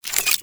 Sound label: Pistol Post Fire Mech; Sentient; Crp Sent Amalg Pistol